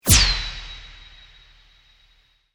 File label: Sweep Mechanical Fast; Created sweeps, swooshes and other movement effects; Sweeper; Sweepers (Sound Effects:Sound Design); SoundEffects-Foundation4